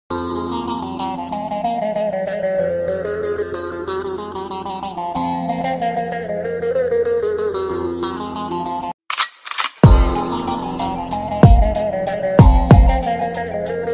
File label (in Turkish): Telefon Zil Sesleri Mafia Saz Trap Zil Sesi